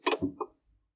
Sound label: car power off